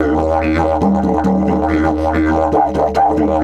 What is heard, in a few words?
140, DIDGE RIFFS; Big Fish Audio - Didgeridoo and Other Primitive Instruments